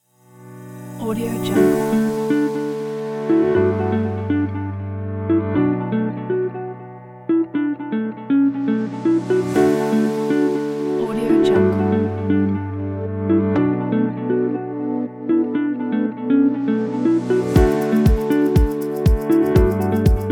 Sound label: camping kit